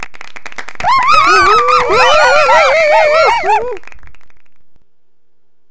Cheer

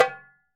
Doumbek Tek

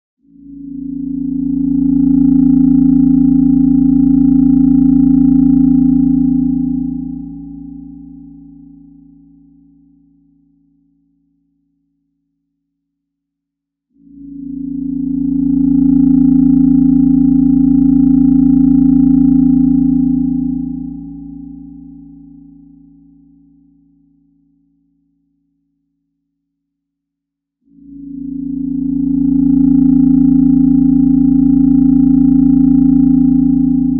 Radar Scanner Deepsea; Deepsea Hydrogen

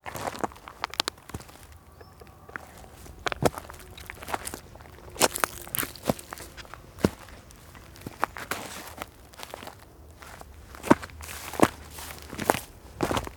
Footsteps Rock Cliff Hiking Woods

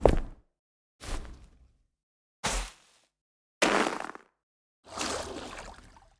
Footsteps

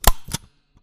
Stapler

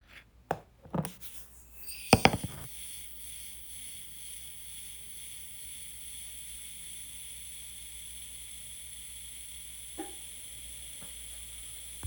Hourglass sample

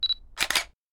Camera Beep And Click

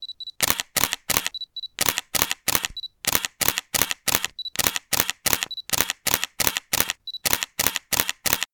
Camera Shutter Serial Mode

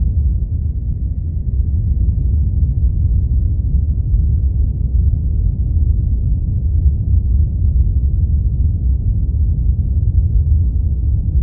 Low Hum

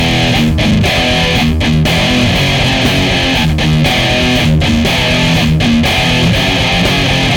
Heavy Loop

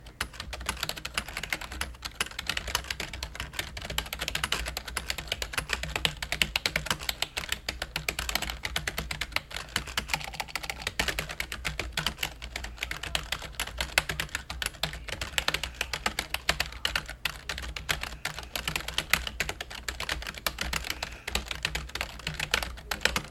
Typing

